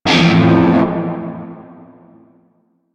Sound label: Shawzin Maj Chord F; Warframe; Narmer Shawzin